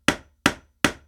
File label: hammering; unsighted